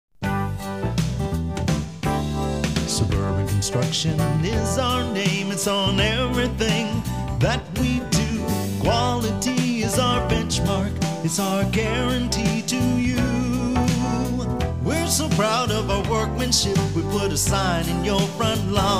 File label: webjingle